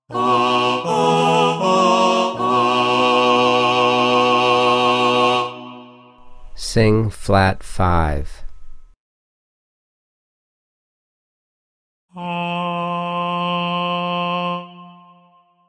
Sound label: B major b5 Voice Tenor; contextual ear training voice edition